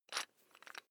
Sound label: electric drill bit fasten wrench turn loose